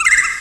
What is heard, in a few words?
wail; Urban Shakedown